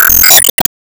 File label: Enemy Flame Skill Hit